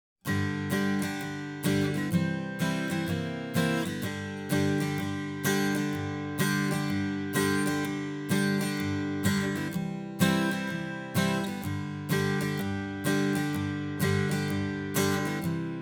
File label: Acoustic EQ; Daking